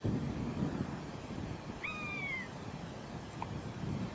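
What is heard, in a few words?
common buzzard; ullapool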